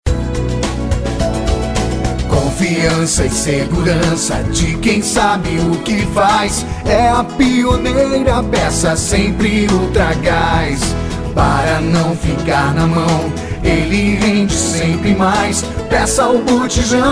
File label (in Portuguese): Jingle Ultragaz